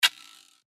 Shaker; revealed percussion vol 3

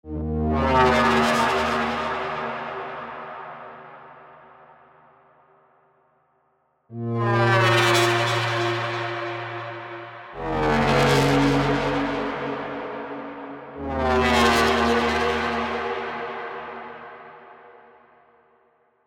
Catacomb Chamber, underworld pads; revealed diva underworld pads

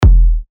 Kick E; revealed techno kicks vol 3; Hammurabi

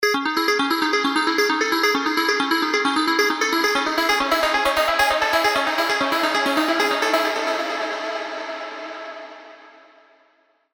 Trance Plucks; Digital Dust; alonso spire trance plucks vol 3

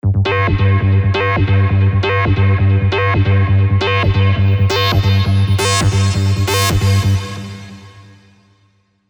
Raw Pressure, techno bass; Spire; revealed spire techno bass vol 1

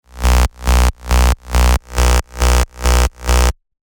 Reversed, techno bass; Spire; revealed spire techno bass vol 1

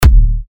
39 D, techno kicks; Caviar; Revealed Techno Kicks Vol. 10

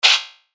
FX Hat, cymbals; revealed cymbals vol 1

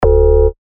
Bass Shot D; synth shots tech house; Adapter; revealed

